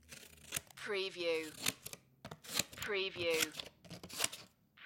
scissors multiple snips

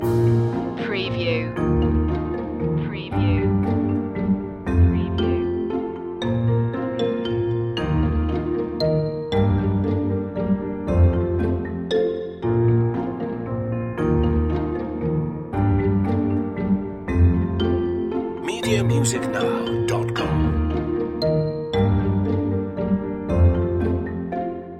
a lovely dance loop

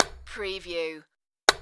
Light Switch

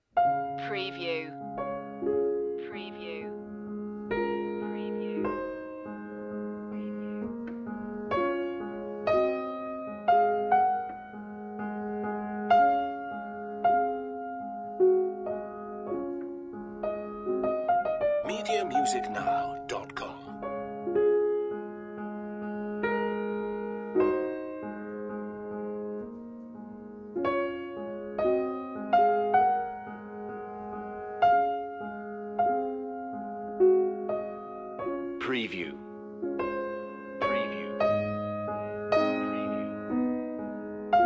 chopin raindrops prelude op28 no15

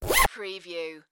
Zipper

